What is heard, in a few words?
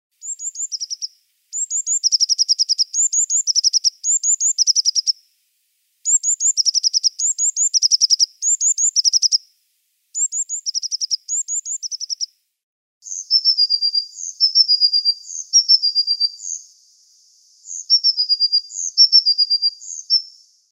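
singing of tit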